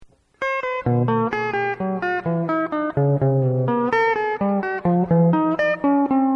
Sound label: Extended Octave Scale